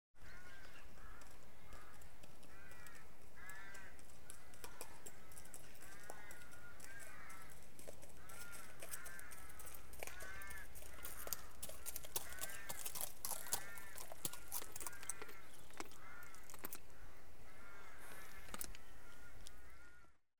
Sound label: Horse; The BBC Sound Effects Library